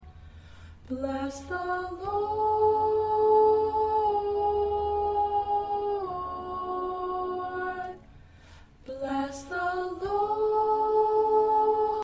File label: Soprano, choir parts